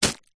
foley packet plastic tightly wrapped small rubber items catch hand